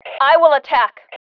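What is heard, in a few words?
attack, female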